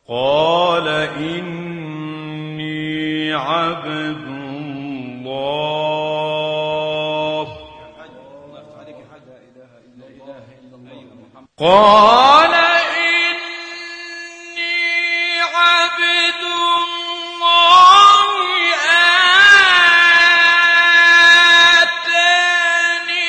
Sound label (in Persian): تلاوت آیات سوره مبارکه مریم; 30 31; استاد عبدالعال